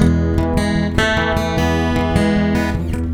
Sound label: Picking G